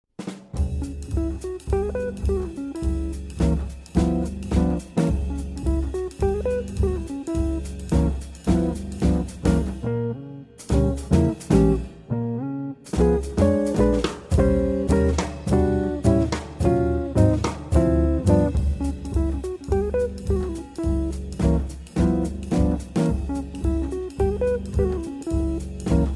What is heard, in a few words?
Twisted Blues